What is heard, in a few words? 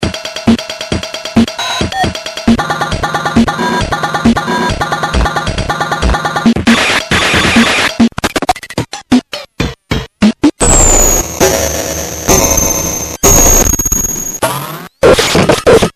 DSI keyboard